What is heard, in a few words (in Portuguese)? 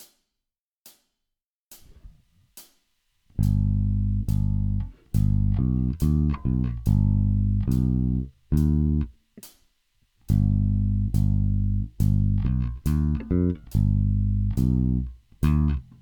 sequencias, baixo rock